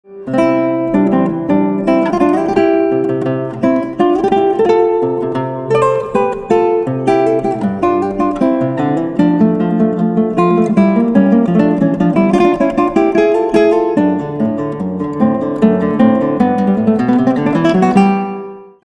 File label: Minuet, barroca